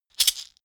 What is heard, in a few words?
shaker wood hit double hard